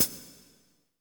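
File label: hi-hat, drums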